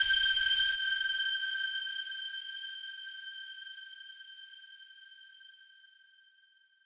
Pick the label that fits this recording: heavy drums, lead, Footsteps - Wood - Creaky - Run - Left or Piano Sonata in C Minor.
lead